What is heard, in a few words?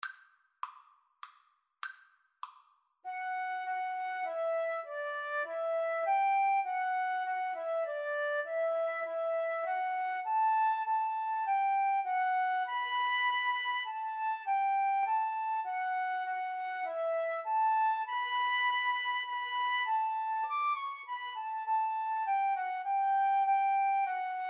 hereford, Guitar-Flute Duet